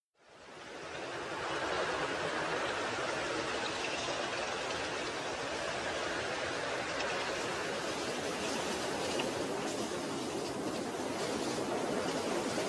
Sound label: Lobby Ambience